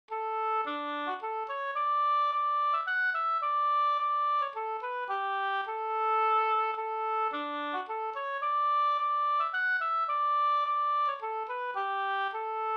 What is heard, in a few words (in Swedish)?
hamburska; Flint-Olle Sd